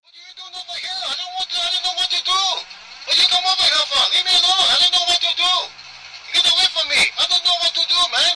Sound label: asian ringtone solo